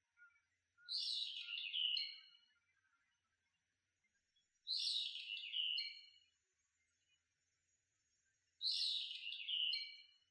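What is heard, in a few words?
brown-eared bulbul call; hiyodori nakigoe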